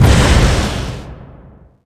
biomech air dive

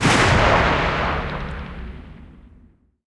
12mm tails far, guns tanks; War Thunder

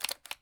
rifle plastic magin fail; Escape from Tarkov

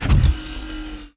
sniper mode off; World of Tanks Blitz; GUI zoom

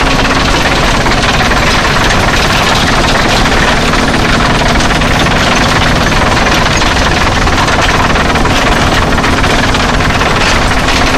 tracks heavy speed, tanks; War Thunder

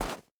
boar footstep snow hard; Rust

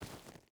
footstep barefoot gravel walk; Rust

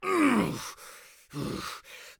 hit; Escape from Tarkov

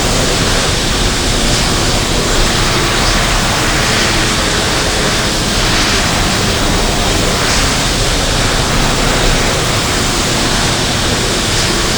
Aircrafts Engines Mig Front Engine; DCS

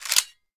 gun ready

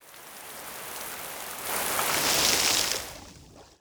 wave 2d

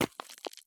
run gravel; Escape from Tarkov

